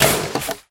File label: headcrab cage open; scripted sequences; hideout